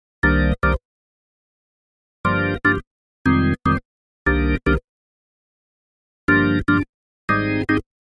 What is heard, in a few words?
organ